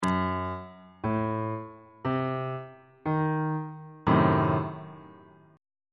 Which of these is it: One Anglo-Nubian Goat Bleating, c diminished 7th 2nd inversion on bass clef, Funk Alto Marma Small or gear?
c diminished 7th 2nd inversion on bass clef